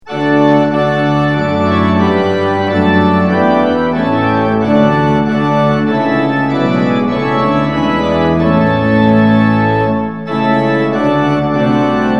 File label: tune; hymns; lillenas